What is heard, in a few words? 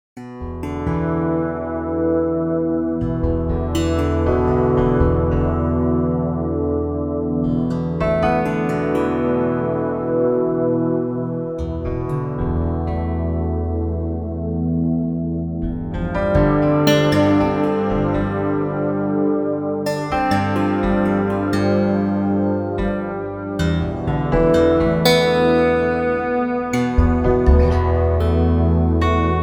Glorious Guitars